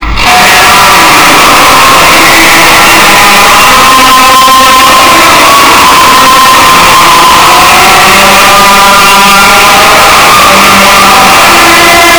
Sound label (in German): Hymne USA